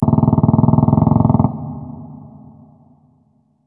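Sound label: distant machinegun; War Ambience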